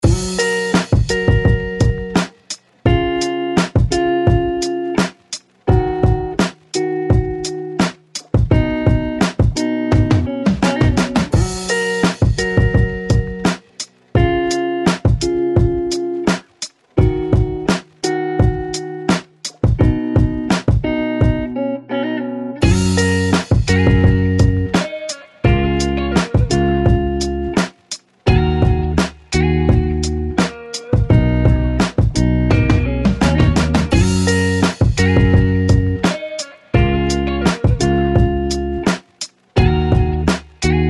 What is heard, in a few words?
rhythm blues